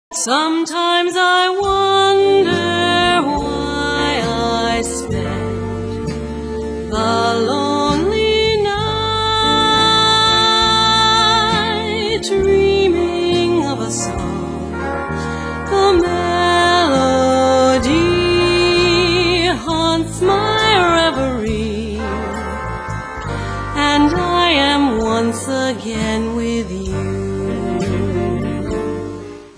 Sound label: Vocal